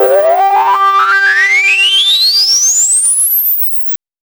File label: FILTER L; AMG - Vince Clarke Lucky Bastard